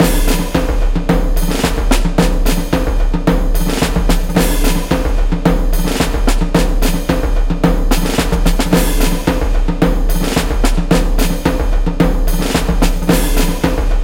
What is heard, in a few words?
110BPM, Percussion; Percussion Kits; Ghosthack; SkyRealm; Kit 03 - Border Patrol